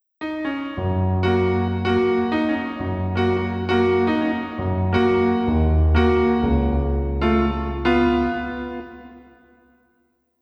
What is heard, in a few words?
Piano Lesson; Tales of Phantasia 20th Anniversary Sound Box; SFC